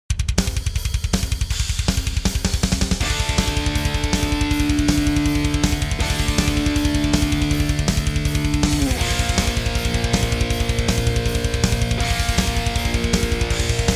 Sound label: Backing Track 160bpm